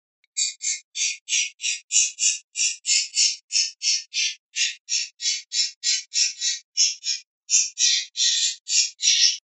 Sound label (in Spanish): rep cosqueos, pardillo